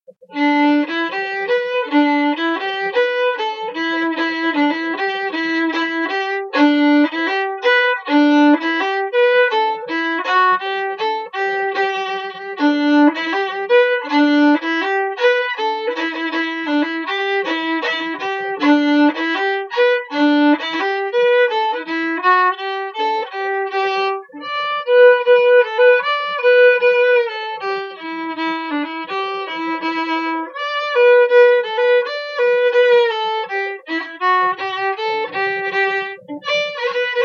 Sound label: Polka; OConnors